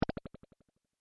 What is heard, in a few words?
Beep Electronic Bass Echoes Variant